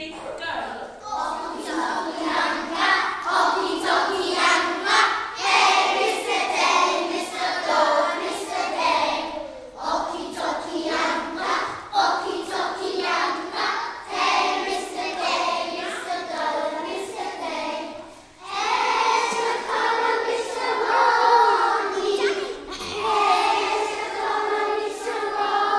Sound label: Y2 singing